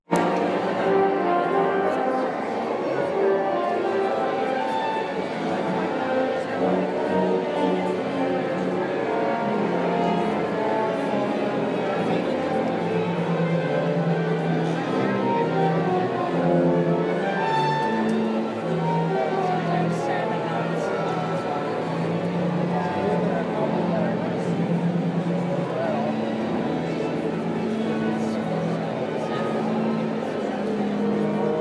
symphony tuning up, Roy Thompson Hall Toronto Ontario; canada